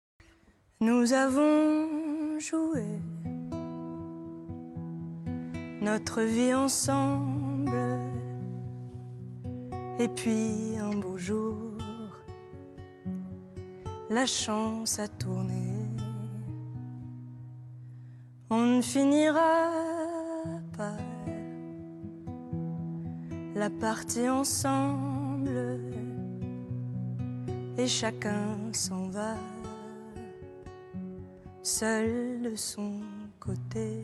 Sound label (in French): guide vocal seul